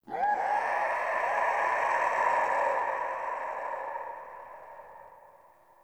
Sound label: Chatter Painful Scream; Ambience; Misc Outdoor; Warframe; Infested Eris